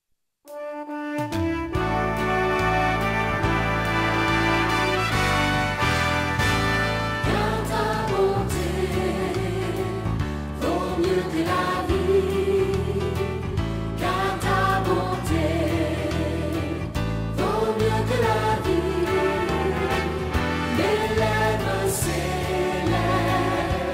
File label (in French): cantiques; Car ta bonté